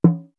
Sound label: SURDO 3 SOFT; East West; Global Sounds